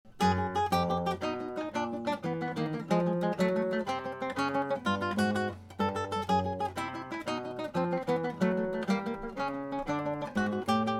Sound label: variation ascending